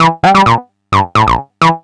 NRG Lead, trance

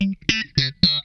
FAZE PLUK, Funk Guitar; 90 s Sample CDs; Zero G

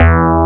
FM Synth Bass, Roland; Club-50 - Foundations

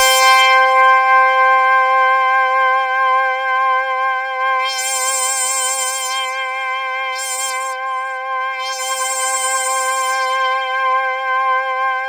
Roland JV 2080, interval; MG INTERVAL

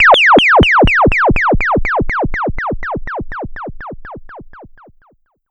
Pollard Syndrum

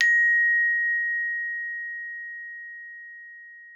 A#4, GLOCKENSP S; Xsample VOL-4

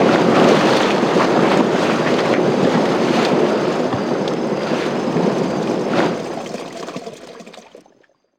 sfx, FX Atmos; Best Service ProSamples vol.36; Chillout

